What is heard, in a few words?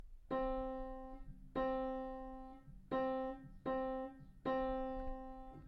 rhythm dog dog birdy dog, violin; rhythm train book 1